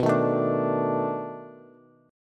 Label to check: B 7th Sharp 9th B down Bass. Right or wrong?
Right